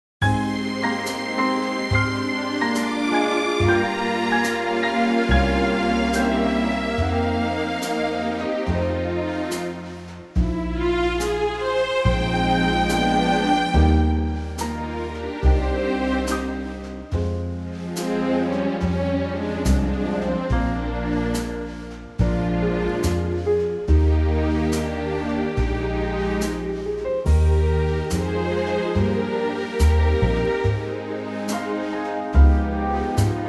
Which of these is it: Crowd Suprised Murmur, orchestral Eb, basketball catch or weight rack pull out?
orchestral Eb